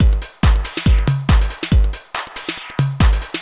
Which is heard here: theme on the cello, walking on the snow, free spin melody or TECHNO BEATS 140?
TECHNO BEATS 140